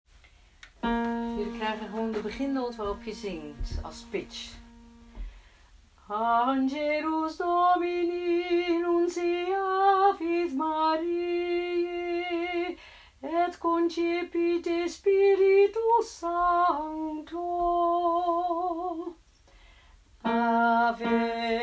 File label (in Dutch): Bas